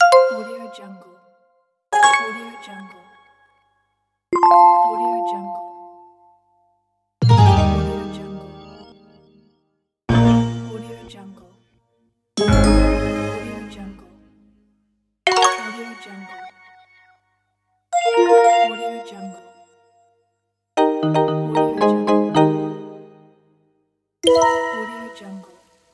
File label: interface sfx pack